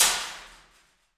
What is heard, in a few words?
Aluminum Wall, FX Perc; Roland L-CD701